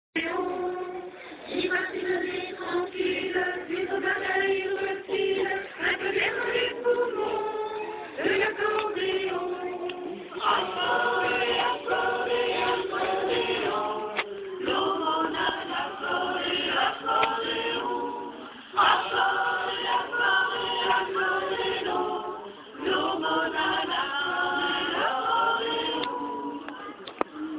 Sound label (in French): Accordeon